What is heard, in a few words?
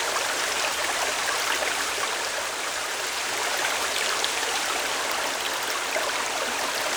Waterfall Small; Midrath Island